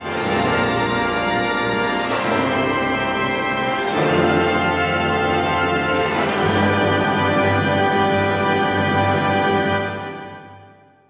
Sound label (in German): Orchester Auftakt Jingle